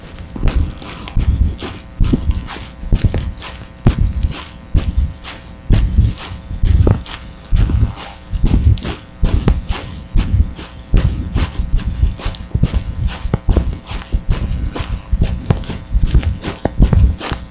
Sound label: dog, soundscape; radio work